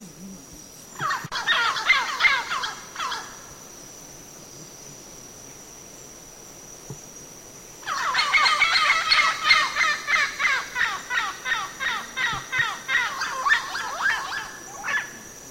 canto do papagaio chaua

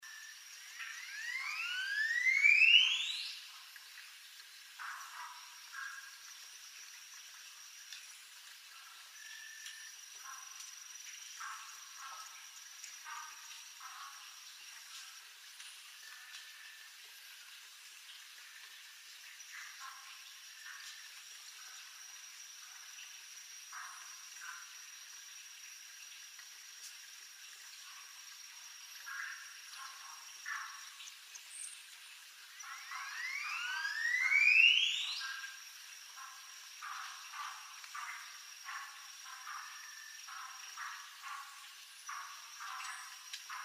canto do jacu do planalto

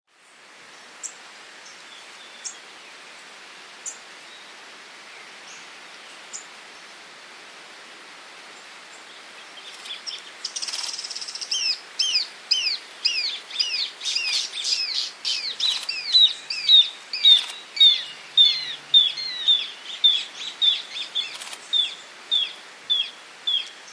Canto do joao botina da mata

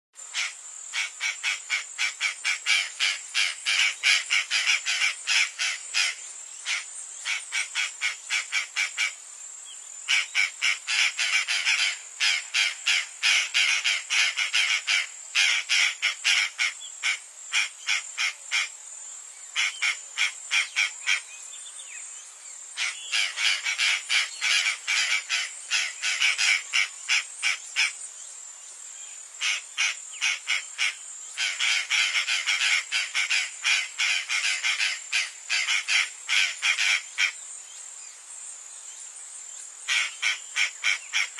canto da gralha do pantanal

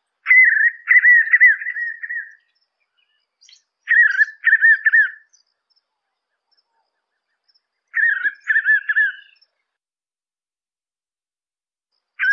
Canto do rapazinho estriado do oeste

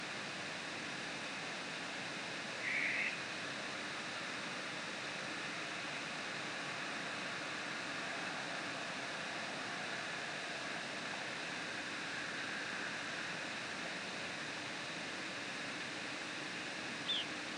Canto da Coruja Suindara

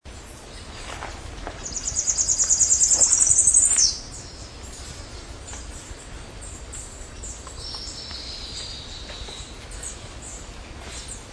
canto da mariquita

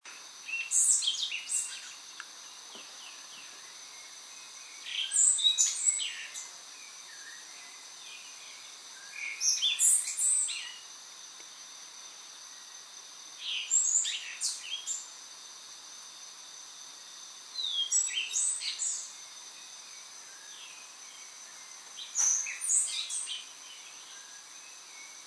canto do sabia castanho

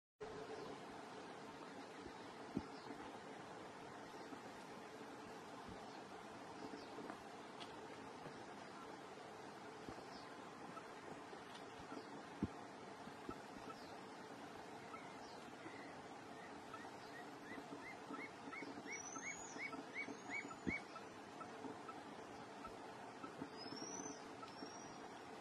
Canto do macuru pardo

